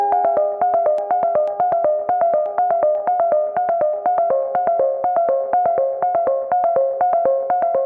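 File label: 122 BPM Arps C major A minor Tonic F; arp essentials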